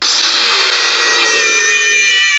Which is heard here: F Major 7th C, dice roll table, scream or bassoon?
scream